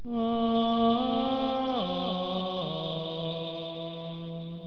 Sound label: heal